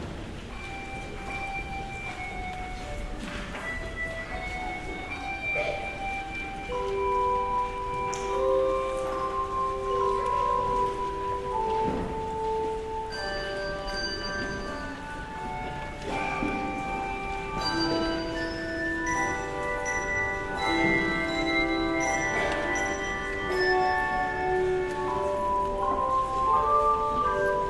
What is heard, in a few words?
Handbells; Christ the Life of All the Living